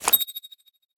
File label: golden leaf put; 摇钱树; ACTIONS